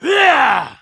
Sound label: troll attack; Halazzi